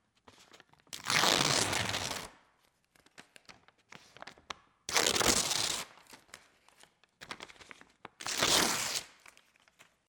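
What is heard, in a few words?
tearing paper sound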